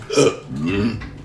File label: hiccup burp